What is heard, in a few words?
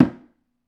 MalaiyuDrm; Club-50 - Foundations Roland; Exotic Drms